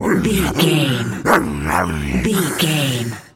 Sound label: tas devil cartoon